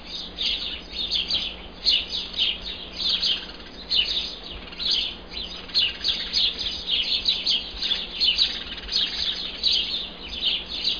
bird sounds

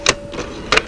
Incoming File

